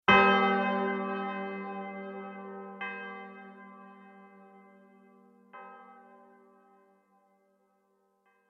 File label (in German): glocke ton massage